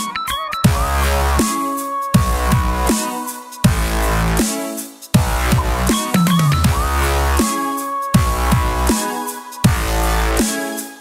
Dubstep

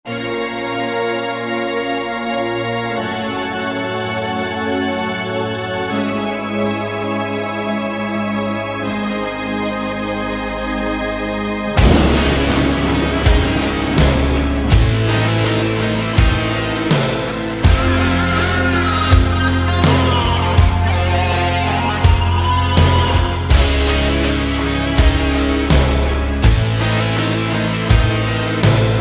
Old Tone; Kane

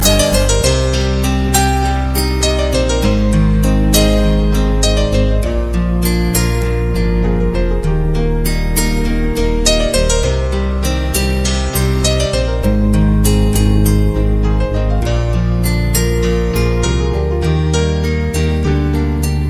Sad Piano

